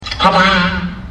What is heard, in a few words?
tada; walle